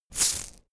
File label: insect shaker hit single hard